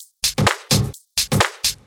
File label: Percussive Loops 128BPM; Vengeance Samples